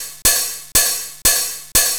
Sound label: Hi-Hat Loop, Dance Beats; Drums